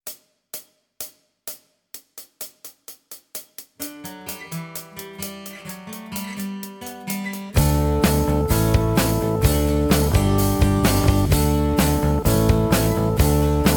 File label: Rock backing, guitar; Guitar Techniques 2019 Issue 290 January